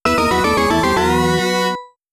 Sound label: Life Lost FM Synth